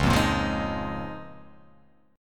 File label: C sharp 6th Add 9th C sharp down